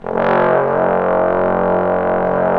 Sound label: BRS BSTBN, Bs.Bone Sect; Roland L-CDX-03 Disk 2; Bs.Trombones